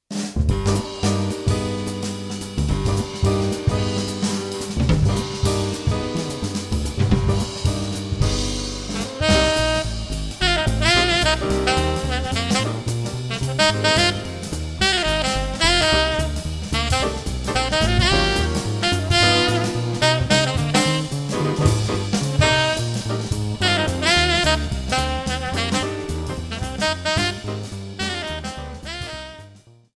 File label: Cold Blues, jazz